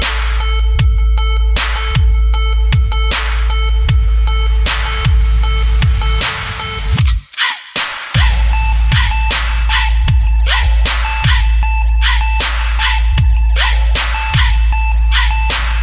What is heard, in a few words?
Trap High Freq